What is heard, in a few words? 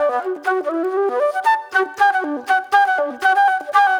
Soul Plate Flute